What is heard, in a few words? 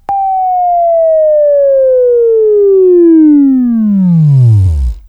Buzz; Labview-Program; Sweeping Training program